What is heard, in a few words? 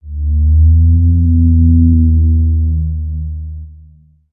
moan; Zomboid; Random Sound Events; Stalker